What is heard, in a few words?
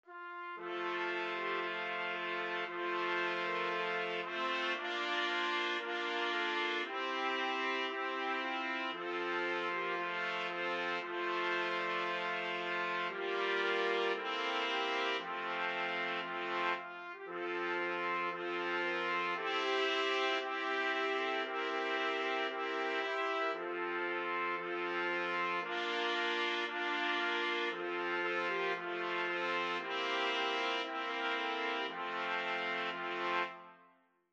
4 trumpet